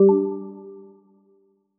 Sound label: error; insiteful; sonic branding ux